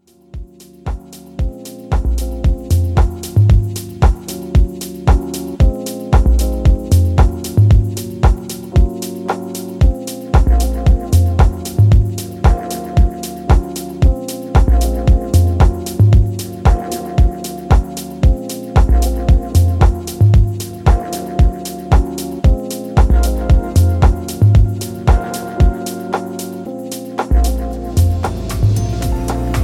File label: Soft Lounge House